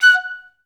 STAC F#5; Best Service; Peter Siedlaczek's Advanced Orchestra

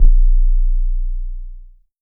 BASS SUB; Big Beat Samples & More

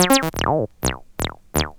FX; AMG Megabass REMIX! VOL-2

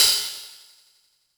Hats, Elektron MachineDrum SPS1 MKII

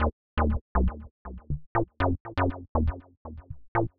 Bass Loop (120BPM); musicradar

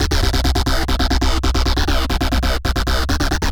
Synco 136 C, future rave samples, 136bpm

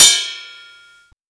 Ride set, Drum and Bass

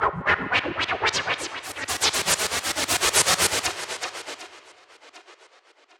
Noise, Processed Noise; hiss crackle

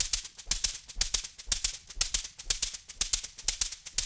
120, Box Brush; Spectrasonics - Supreme Beats - World Dance

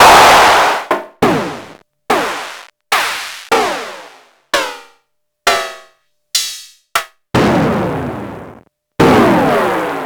Short Hats

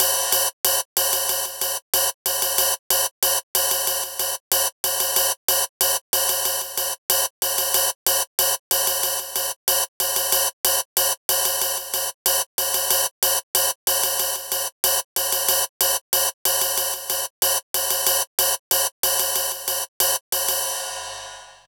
River Ride, Cymbal; Zero-G Smoke

